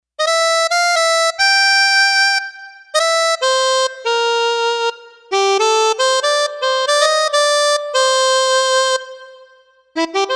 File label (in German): Harmonika, synth